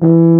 F-TUBA E0; Best Service; Alpine Volksmusik

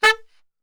A 2 SAX SHOT

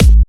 Electro Kicks

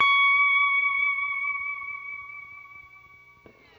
Guitar & Bass; Quantum Leap

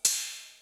ride, Millenium MPS-400

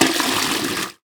Flush, household rhythm samples Hits

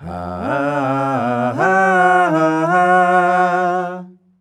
HAAAAAAH, MALES; Best Service; Hyper Dance

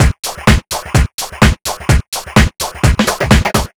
Full, Drum Loops 127bpm; Zero-G; Soundclash Nu Dance Electro; Acidized Wavs; Ugly Funk

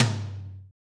low tom; Giga Samples Collection; RY30

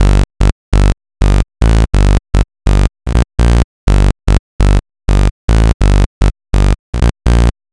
Bass, 124bpm, Nu Dance Electro; Zero-G; Soundclash; Combi Loops; Final Cut

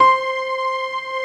soft piano strings, Slow Strings; Optical Media International; Sonic Images Library

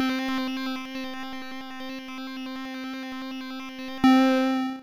synth, amiga med

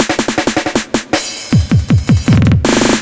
drums, Hardcore Breakbeat

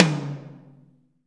TOM TOM, Roland

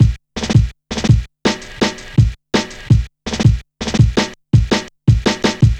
Check A, Jungle, Drum Loops 165.5

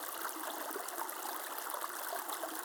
Waterway G Light

